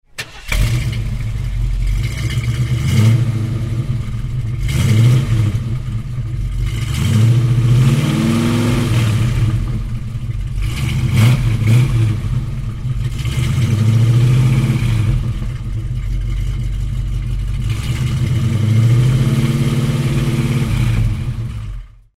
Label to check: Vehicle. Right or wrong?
Right